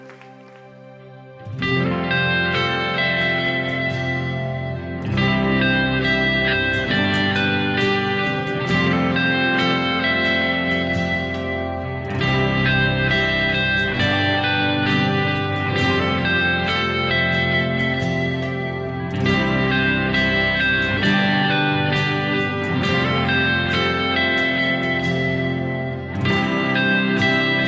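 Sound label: electric guitar intro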